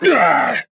Grunt Attack; paladins; Androxus

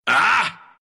pain, ru; dota2

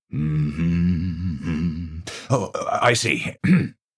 distracted humming, Oh! I see., clears throat; Sigma; overwatch

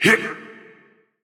Grunt Jump

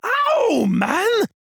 Taking Damage; Knight

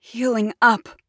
Using Healing Potion; Realm Royale; Mage; Kunoichi

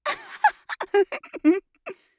Mage Laugh; Realm Royale

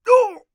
Warrior Grunt Hit; Hi-Tek Trooper; Realm Royale

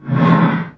horse; Illuminate

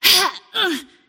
pain; antimage

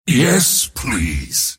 last hit pain; mars

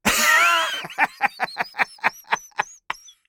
Warrior Laugh; Hi-Tek Trooper; Realm Royale

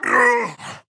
Grunt Jump; Grover; Paladins

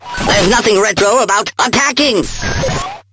attack; Awesomenauts

